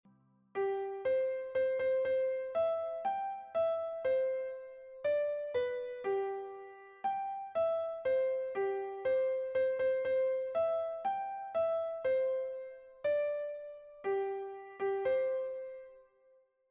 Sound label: The wheels on the bus Piano